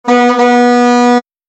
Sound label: truck, airhorn